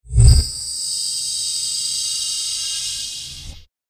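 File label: Deep Servo Open Long Tail, stinger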